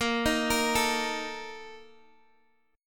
Bb Major 7th Suspended 4th, Guitar, DADGAD; x,13,13,15,12,x

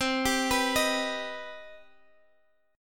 C Minor Major 7th C 4G,5C,5E,5A

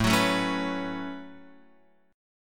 A 6th Add 9th Guitar Open F, chord; A6add9; strummed

